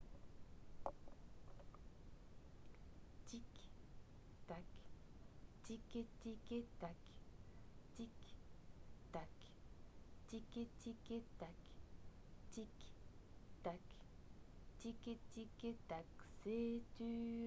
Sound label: Tic-tac